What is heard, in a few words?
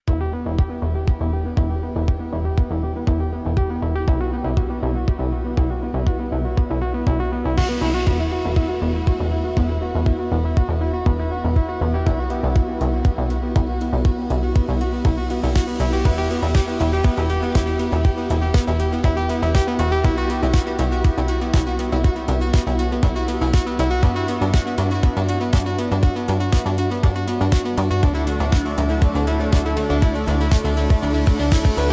Electronic Impulse